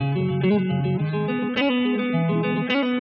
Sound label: informed estim guitar; WASPAA11